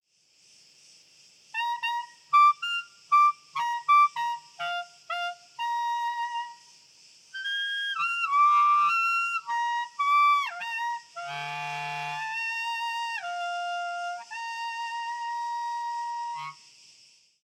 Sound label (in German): Oberton Spiel Rein